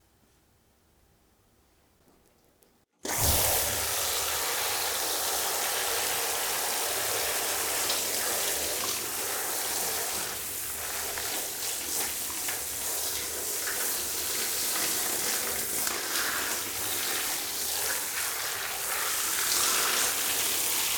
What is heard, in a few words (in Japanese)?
シャンプー